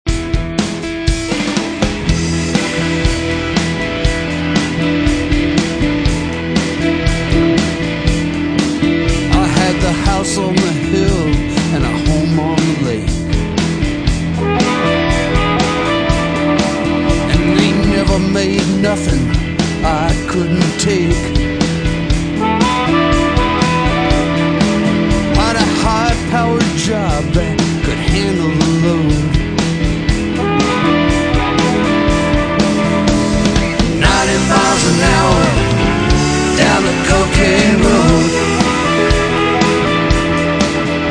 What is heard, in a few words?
Blues Band; Indianapolis